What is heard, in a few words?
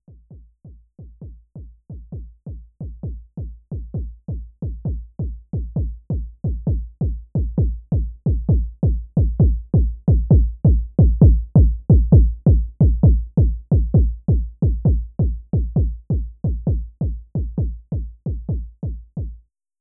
kick